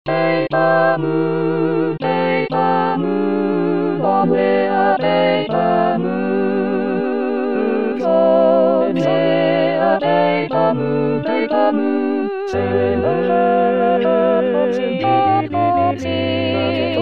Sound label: All singers